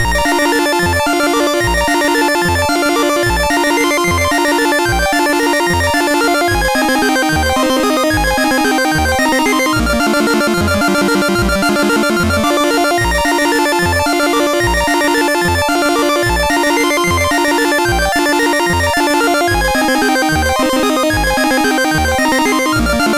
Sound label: Roller Coaster, MOS6581R3; BOGG